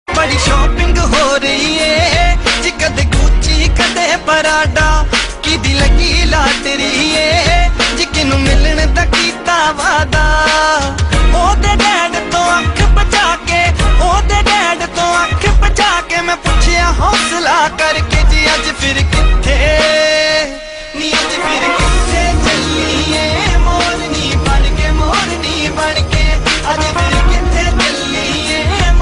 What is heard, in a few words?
rap new